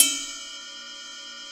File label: RIDE BELL; Big Fish Audio; Synth City